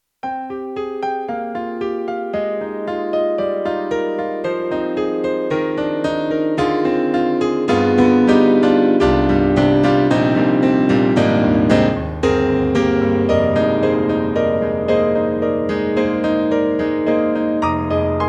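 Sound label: YAMAHA MU1000EX Mellow Grand Piano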